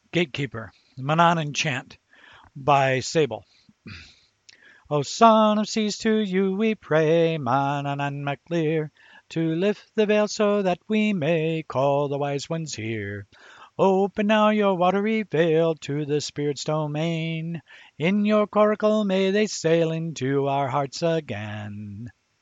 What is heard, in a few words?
Manannan Chant